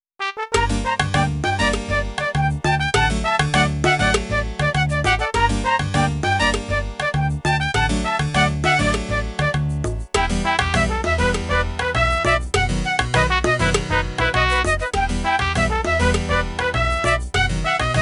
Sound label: Flute; RuneScape